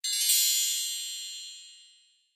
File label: Music Logo Hi Chimes